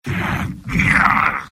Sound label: sniper negative vocalization; tf; mvm